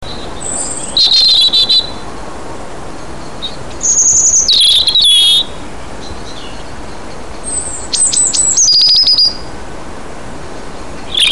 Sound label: robin